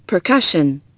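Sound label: percussion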